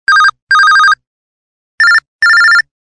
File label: Telephone; KF755 Secret